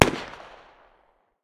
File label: smg 45 medium distance; tacz-tweak-pack; taczmechanics